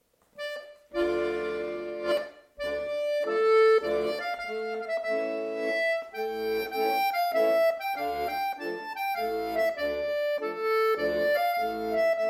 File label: menuet